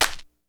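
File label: clap, Hip Hop DRUM; InVision Interactive; 40 Oz Phat Slammin Hip Hop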